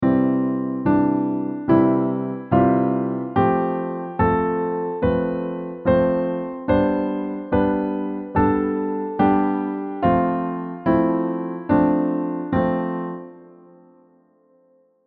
Scale over chord progression

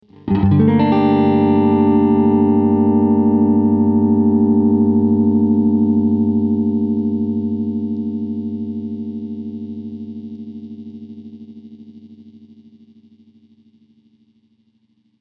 Guitar heavy compression

